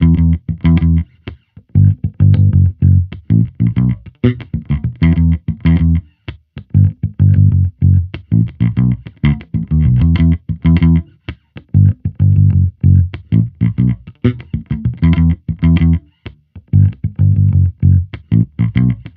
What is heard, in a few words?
Amped loop 96 Em, Electric Bass Loops; Slate Digital BASSHOLE Sample Pack